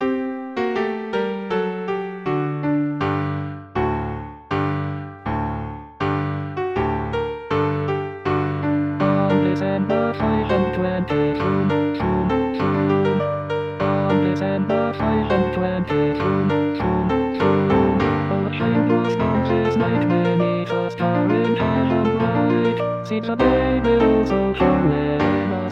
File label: SATB Tenor